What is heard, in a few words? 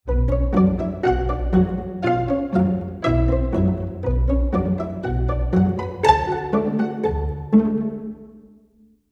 Chamber, pizz; Hall of the Mountain King; Spitfire